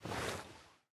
armor; smite; baronsamedi skin7

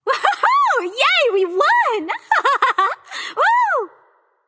yay; smite; persephone

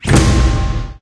intro slam; guanyu; smite; hades

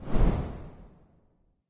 swish; Smite; Zhong Kui

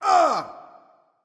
grunt hit; erlangshen

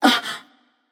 surprised passion; chaac

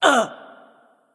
surprised; smite; kali

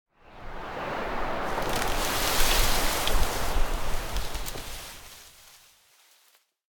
ambience foliage; smite; conquest

